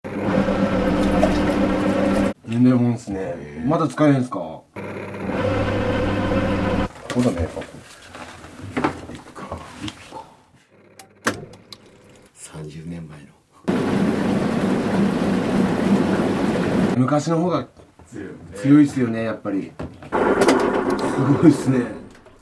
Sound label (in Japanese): sentakuki